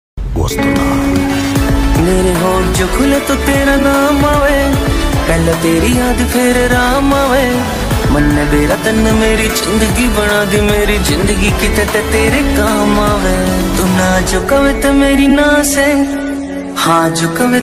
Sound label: devara bgm ringtone love ringtone romantic ringtone